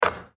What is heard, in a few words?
Basketball Net Swoosh; Basketball (Sound Effects:Sports)